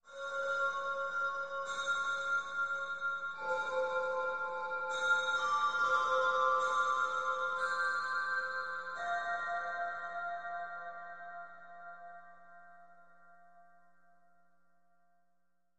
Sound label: Music Bed; Ethereal Tube Bell Pattern, From Distant Perspective. Lots Of Reverb.